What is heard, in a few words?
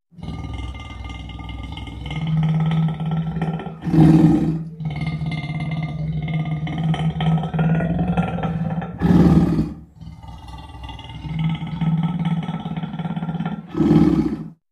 Lion Growl Massive; big cats